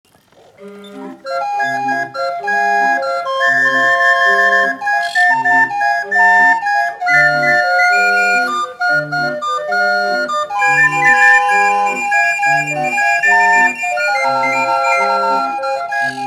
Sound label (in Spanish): Organillero; paisajes sonoros